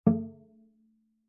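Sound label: Cello pizz fortissimo sul C A2; MIS Pitches - 2014; Strings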